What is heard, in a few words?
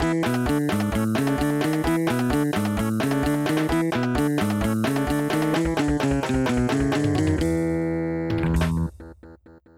Game Complete; Johnny Crash Stuntman Does Texas